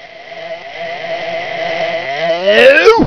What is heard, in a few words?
BARF